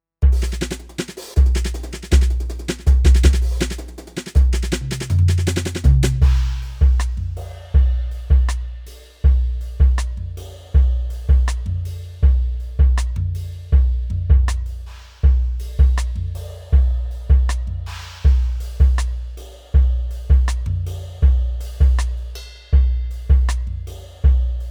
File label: Brush Set